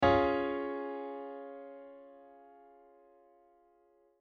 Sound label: C Sharp Chord